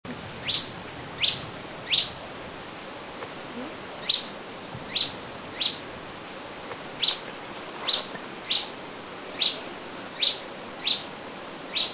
Tarefero, Sittasomus griseicapillus, Olivaceous Woodcreeper; cantos